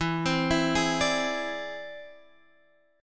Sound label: E Augmented 7th E down Guitar Half Step Down; E+7